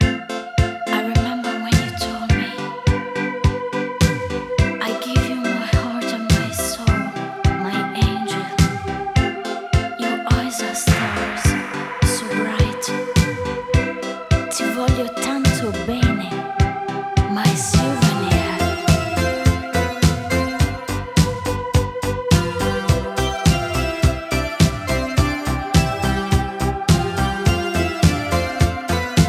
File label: Saxophone Instrumental